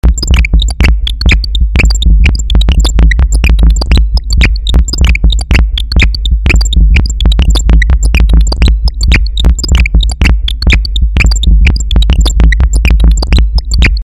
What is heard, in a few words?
non oiled gate